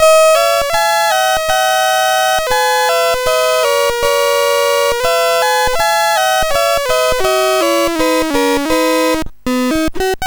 Klarinettenkonzert No 3, MOS6581R2; Stamitz

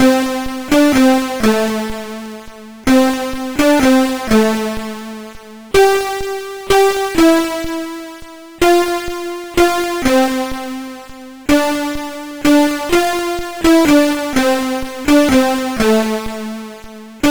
Stille Nacht, MOS6581R3

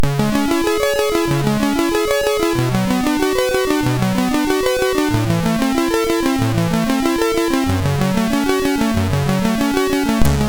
Gitarre, MOS6581R3; sid

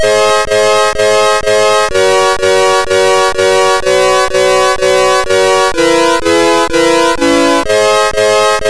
Akkorde, MOS6581R3; sid

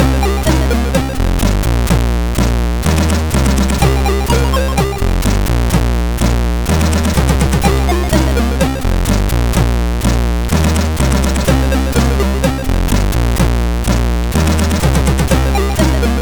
Uhrwerk; hvsc

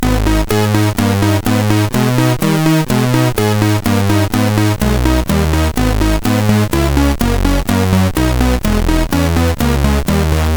Menuett; MOS6581R3; hvsc

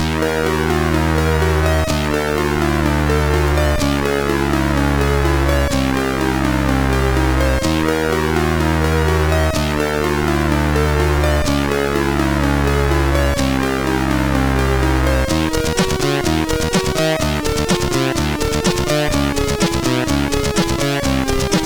Coole Instrumente, CSG8580; HVSC

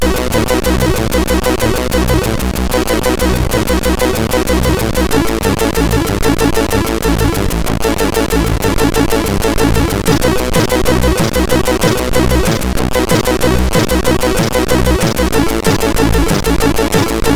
Levelmusik, MOS6581R3; hvsc

